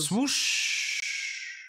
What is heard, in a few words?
swooosh